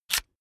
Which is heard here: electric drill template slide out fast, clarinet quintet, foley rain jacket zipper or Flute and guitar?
electric drill template slide out fast